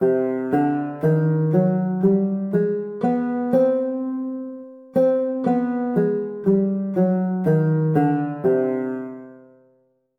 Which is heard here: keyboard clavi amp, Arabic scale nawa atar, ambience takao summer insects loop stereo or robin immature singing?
Arabic scale nawa atar